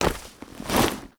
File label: Grab Mask Pull Foley; Teshin; Warframe; The New War; Throne Room